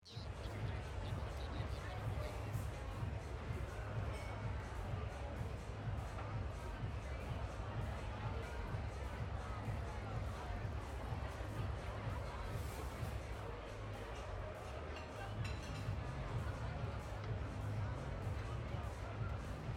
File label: peephole